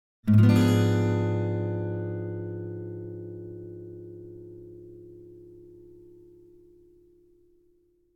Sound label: Acoustic Guitar - Minor Chord - Septa Chord (7); Hollywood Edge; sound designer's toolkit v2